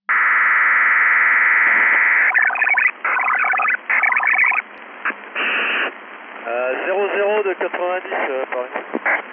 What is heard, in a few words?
SYS3000 ALE, THALES SKYMASTER 8-FSK, ALE/ALM SYSTEM